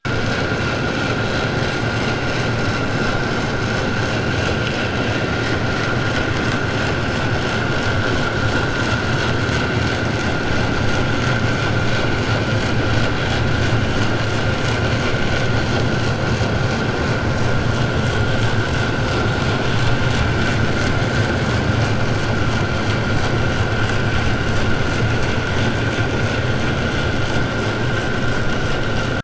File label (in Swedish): lastfartyg